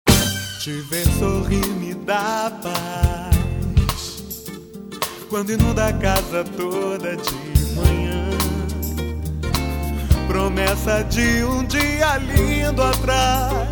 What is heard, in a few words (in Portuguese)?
acorde lindo; enricodepaoli